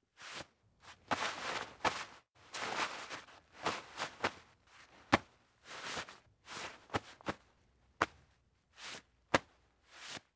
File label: Body Pat Clothing Grabs Hand Slide Release; Foley - Props; Foley Essentials